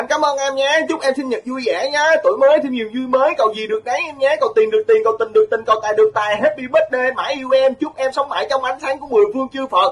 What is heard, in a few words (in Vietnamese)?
chuc mung sinh nhat; van mau